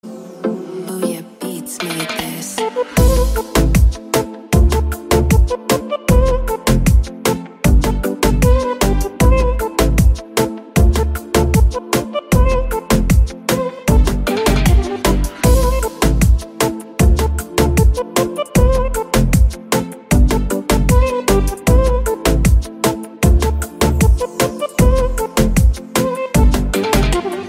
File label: beats